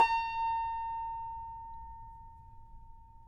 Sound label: CUMBUS A#, Guitars; Spectrasonics; Hans Zimmer Guitars VOL-2